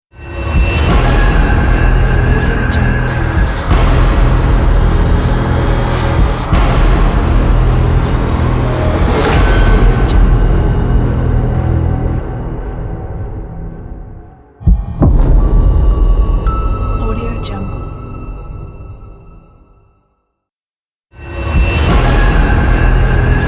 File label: lullaby logos